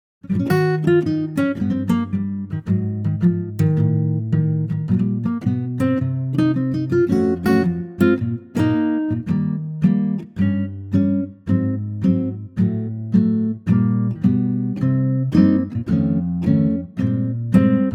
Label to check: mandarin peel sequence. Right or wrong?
Wrong